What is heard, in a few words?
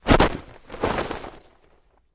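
sounds of parachute jump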